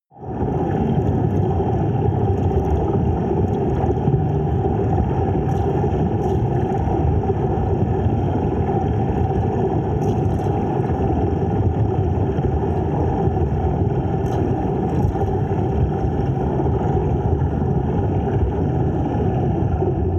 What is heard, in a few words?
water fx dark